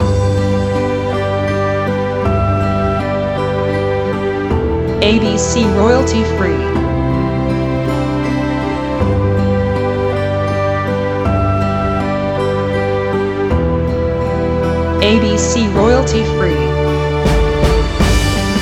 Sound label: Celtic Irish March